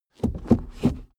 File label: suv change reverse gear slow; verkehr transport; autos